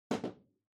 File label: Power Strip Far Put